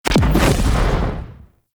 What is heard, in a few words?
Rocket Explosion; Jelly Rocket; Warframe; DE; Vehicles; RailJack; RailJackEnemyShips; Grineer; Space Fighter Dredger Agent